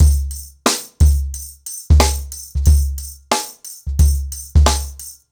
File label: Nuclear 90BPM, ACOUSTIC DRUMS